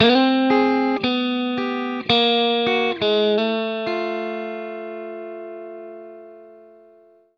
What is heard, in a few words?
SH END, SHUFFLE, Hot Steel Blues; ILIO